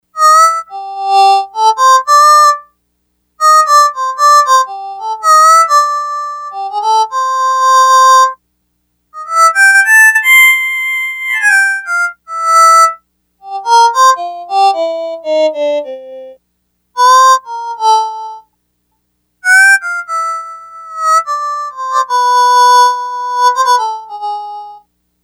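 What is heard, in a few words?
Harmonica, Yamaha DX100; Factory Patches; BC1 Hrmnca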